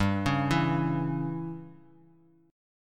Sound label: Db Diminished G